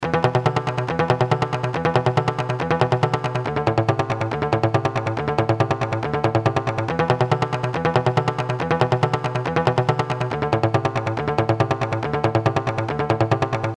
Love Arp, Synths; Industrial

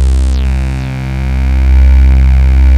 SYN DANCER, DANCE; Pro-Rec

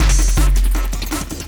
LOOP, Drum & Bass, 160 BPM

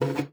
GUITAR FX; Xsample VOL-2

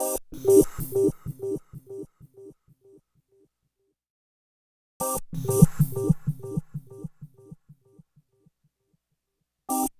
Whisper Echos; Dr. Fink's Funk Factory

